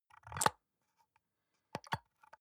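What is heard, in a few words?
soap dispenser press squish slow release, bathroom; haus-garten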